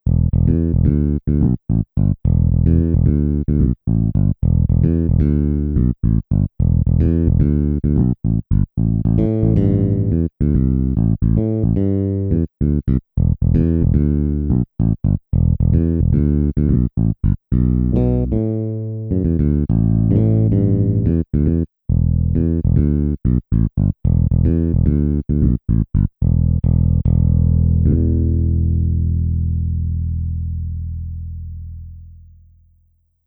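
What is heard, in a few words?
pick bass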